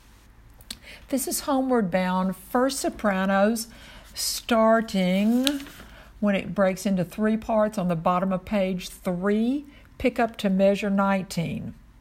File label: Sop, childrens choir